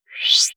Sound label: Breathy up quick